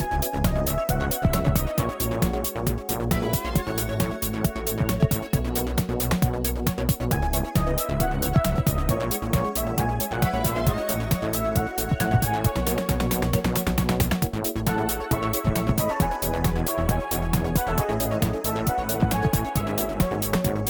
Menu Loop

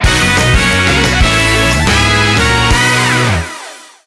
Jingle Win; super monkey ball banana blitz

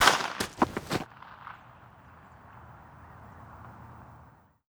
Suspect Dead

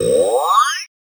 Logo Explosion

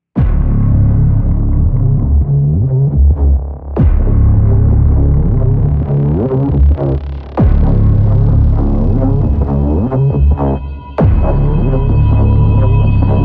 Main Ambient; Doom Hunter Base

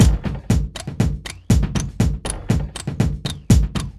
Music Carrybeat; Fridge

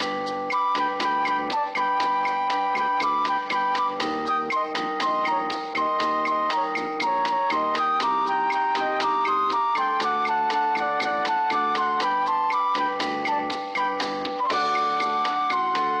Elevator; Rise of the Triad

